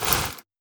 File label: aim foley raise